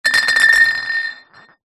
bottles glass milk empty knock over

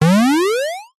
multimedia game ascend climb jump synthesized

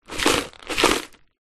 dirt in plastic pot shake toss

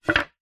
wood slat pine set down solid ground; foley

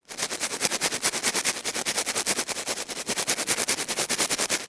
household nail brush scrubbing nails; zapsplat

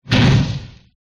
warfare mortar projectile launch

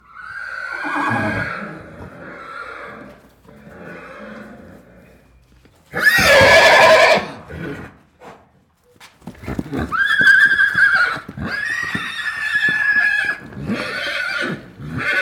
Arabian horse neighing loud; Sound Ex Machina

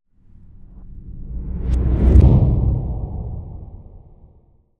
Swell To Impact Boom; bottlerocket

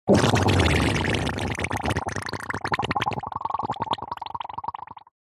cartoon slime big squelchy bubble dribble; zapsplat

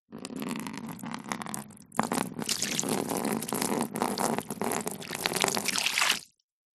household water pour drips onto plastic lid

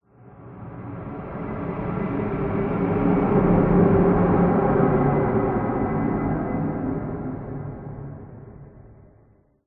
horror swell dark sinister empty

